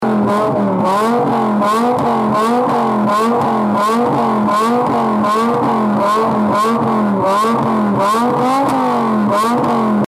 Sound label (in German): Auto Geraeuschmessung, motorradlaerm